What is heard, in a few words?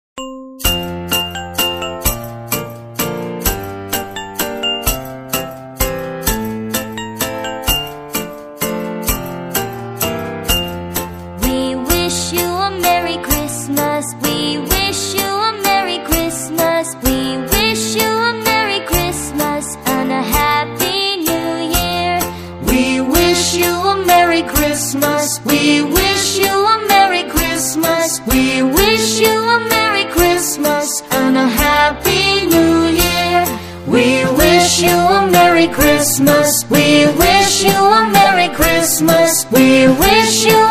Jingle Bells Christmas Song